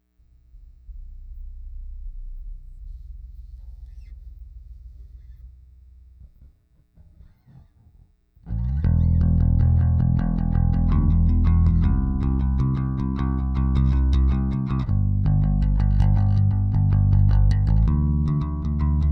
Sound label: Bass